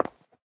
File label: concrete step; Golden Crayon Sounds